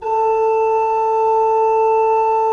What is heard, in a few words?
A3, Cathedral Organ; ILIO; Propeller Islands Cathedral Organ